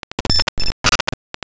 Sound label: buffer fuct loops